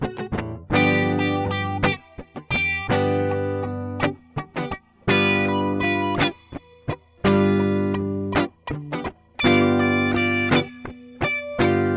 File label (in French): Authentique Guitare Blues